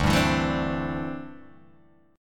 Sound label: D 7th Suspended 2nd Suspended 4th, Guitar Open G